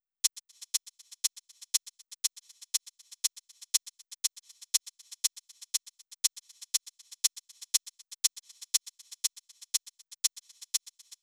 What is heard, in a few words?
Drums 120